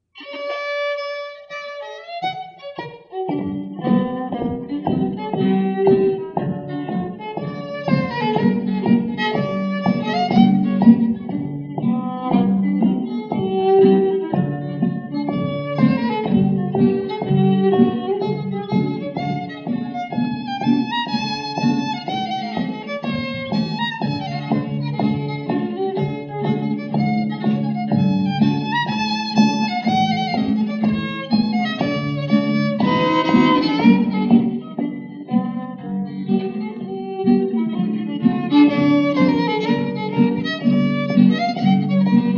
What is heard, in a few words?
Texas Schottische